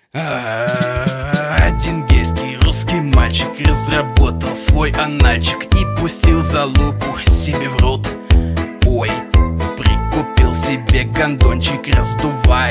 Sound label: remix